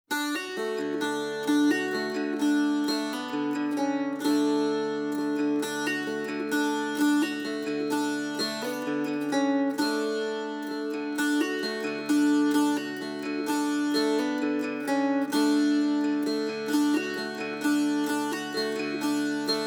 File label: Dulcimer Only